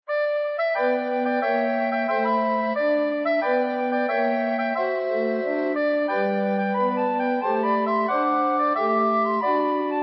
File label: recorder quartet